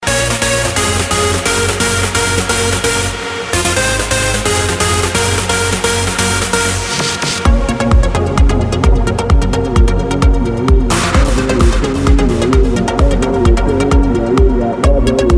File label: Melody Trance